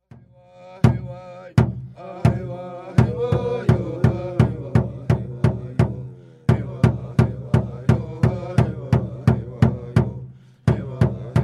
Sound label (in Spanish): kikapu canto tradicional pueblos indigenas en riesgo musica de la frontera norte